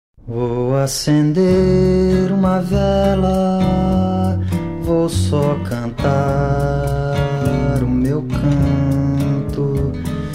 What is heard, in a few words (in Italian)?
ULTIMO CANTO